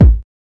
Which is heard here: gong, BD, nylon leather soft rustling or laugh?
BD